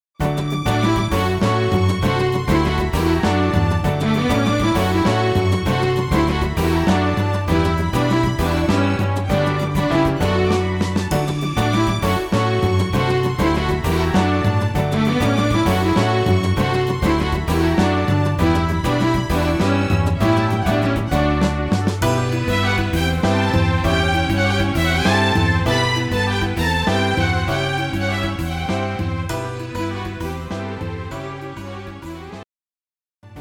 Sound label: Bikalam For Female